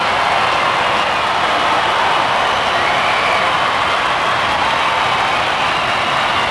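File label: crowd loop; Splashdown; XBOX; Soultree; Madrid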